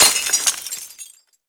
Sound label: alien tripod debris glass falling; BluezoneCorp